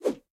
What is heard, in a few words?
Swing Large